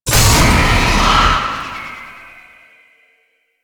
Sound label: Evil Op Stinger; Entrati Labs